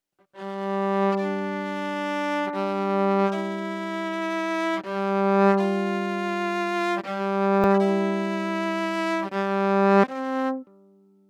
Violin

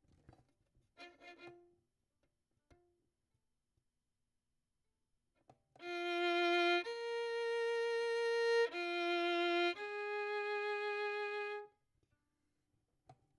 Violin; Verdigris Music

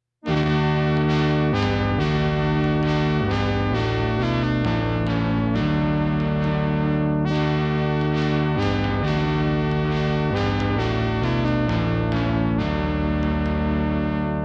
Regal Fanfare, sound fx

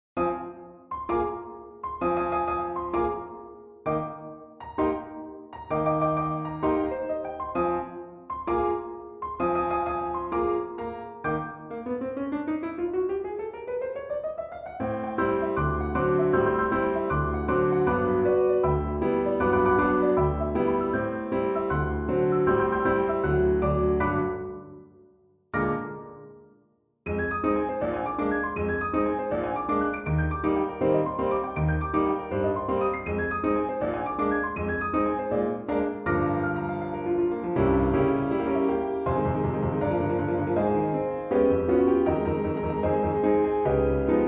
Piano Jazz

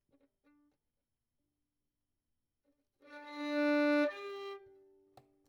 Violin; Verdigris Music

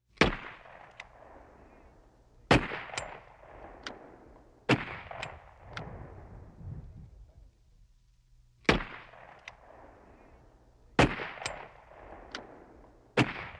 Rifle Shots

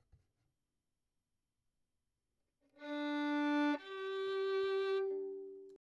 Violin; Verdigris Music

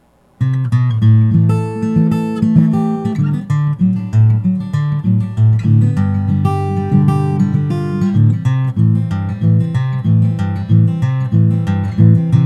Instrumental Intro; REVEAL NEWS

